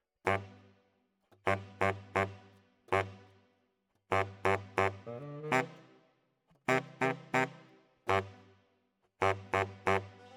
Bari, RNR for piano

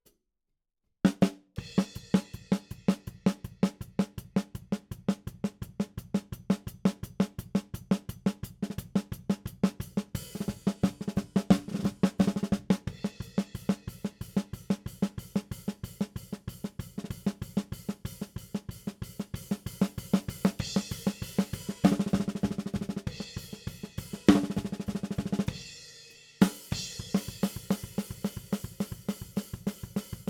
SNR top

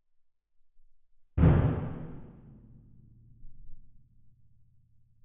Perc

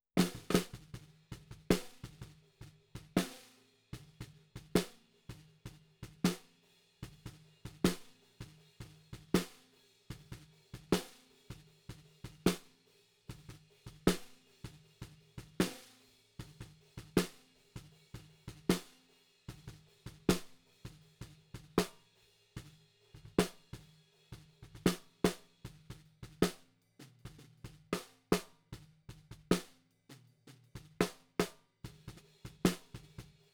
SNR B

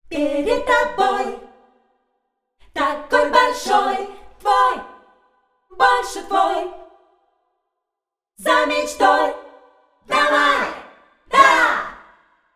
ALL CHOIRS

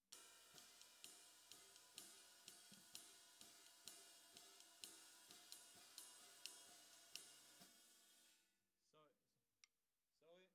SNR

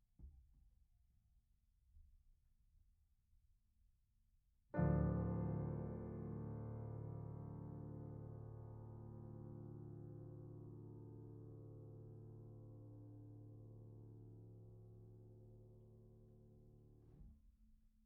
Piano Mono

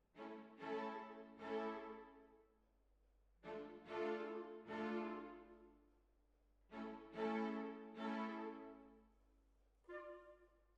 Hall

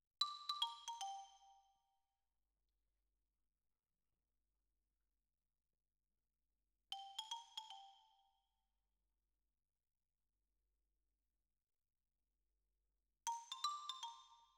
Xylo

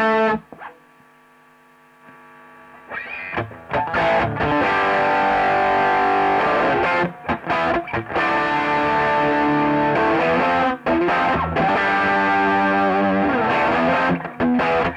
E Gtr

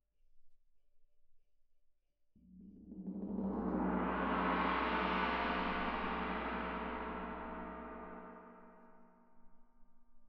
Tam-Tam

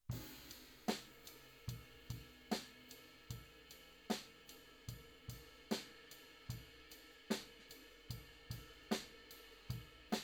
floor

